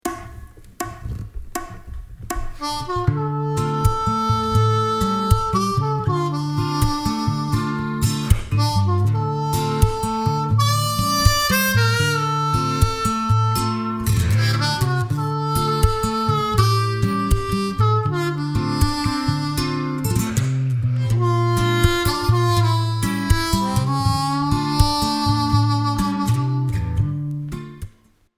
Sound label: St. James Infirmary Playthrough with Backing Track